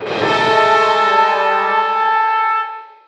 smoker drag ambience; umbrella

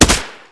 mac10

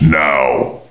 bomb defuse; funsystem

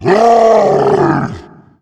Tank Fire; cstrike; zombie plague

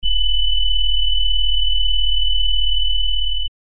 player he damage

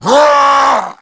pain tank; umbrella

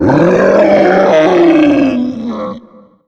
Tank Death, zombie; cstrike; zombie plague

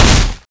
charger punch; umbrella

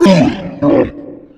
Tank Pain, zombie; cstrike; zombie plague

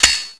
glock slide release

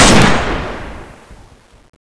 Enfield

